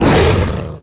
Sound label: Monster Attack Finisher; Monster Ralph